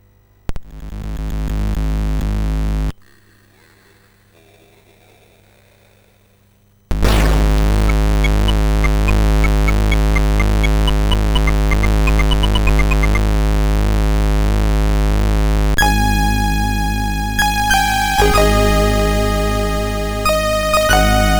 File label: MOS 6581R4; SIDBench